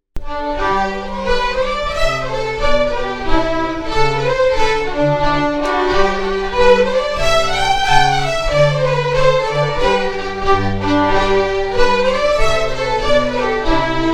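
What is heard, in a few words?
Jigg, Scottish Fiddling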